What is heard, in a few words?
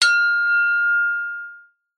impacts metal med small hit resonate